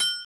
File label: ting; sgi